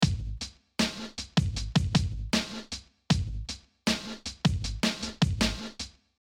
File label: Boom Bap 78bpm; inside rap creating an instrumental